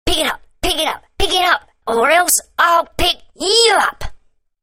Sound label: Picking Drop